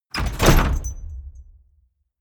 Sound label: medieval menu heavy close